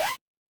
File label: retro game power up